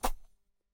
footstep hoof

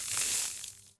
leaves

